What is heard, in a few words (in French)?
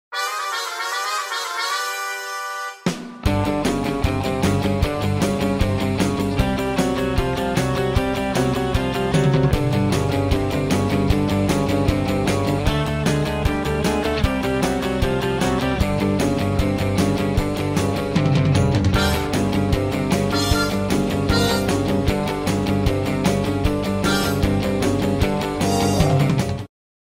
sonnerie; batman